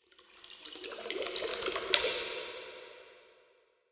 water swell; soundscrate

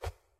cloth pull; soundscrate

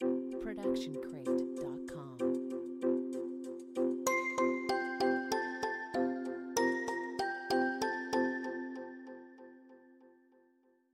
Corporate Ident Twinkle; SoundsCrate

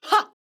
woman attack; soundscrate

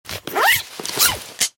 zippers and velcro

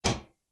Metal Footfall; soundscrate

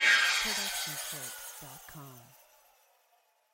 anime laser

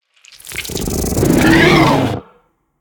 monster roar short; soundscrate

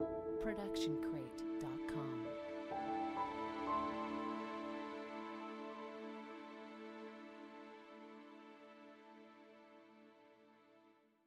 feel good strings plus mellow piano; soundscrate

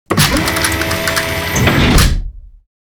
Robotic Movement; SoundsCrate

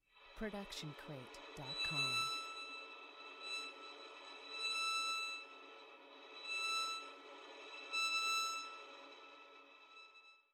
Violin Airy Scratch Harmonics

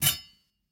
sword hit; soundscrate

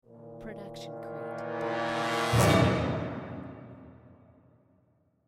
Brass Cres C Orch Hit; SOUNDSCRATE

